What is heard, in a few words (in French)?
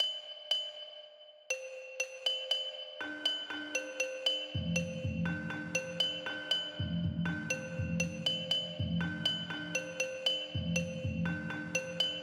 canon rythmique en canon avec reprise